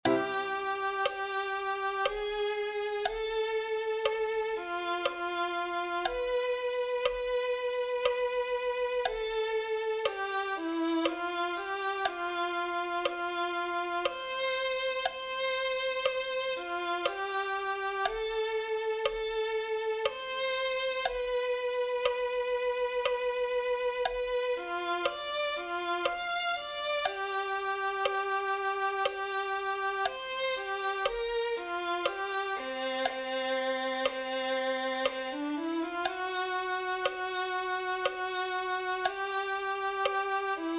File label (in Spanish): oh tu fidelidad violin